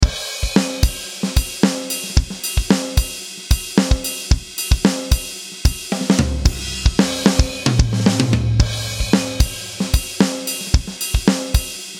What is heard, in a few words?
Drum